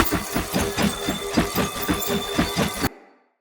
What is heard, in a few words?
Long Sword Recall Loop; Weapons; Player; Pothia Long Sword; Swords